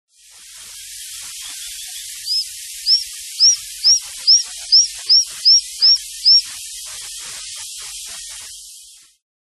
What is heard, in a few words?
Boophis liami